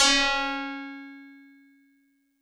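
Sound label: Tubular Bells DX100 C sharp 0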